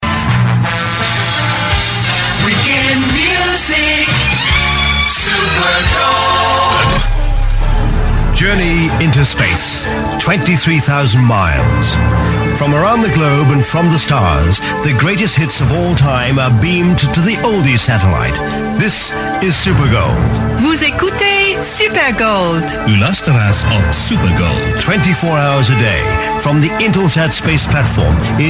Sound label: radio jingles